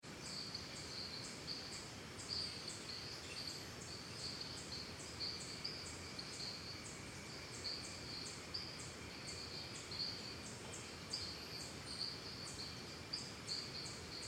Birds Crickets Costa Rica Calm, forests and jungle; Backgrounds; BigRoomSound